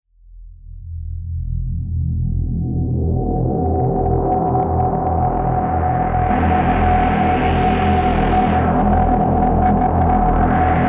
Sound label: Synthesizer Resampling Drone; Workshop Korg Kaoss Replay